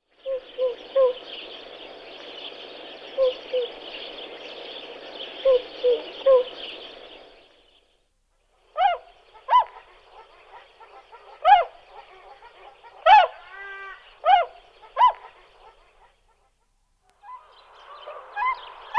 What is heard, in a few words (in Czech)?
Labut mala Cygnus columbianus